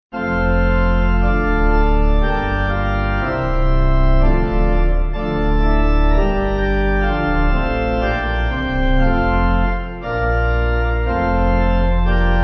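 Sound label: Pipe Organ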